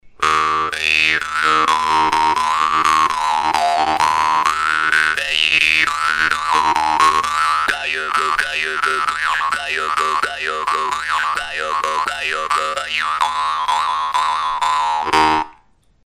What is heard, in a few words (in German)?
hoerprobe vargan; vladimir potkin